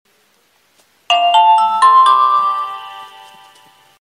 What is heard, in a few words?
nokia 1112 Alarm